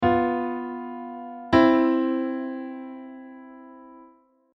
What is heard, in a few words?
dominant motion